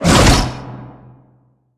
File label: creature brute shark chase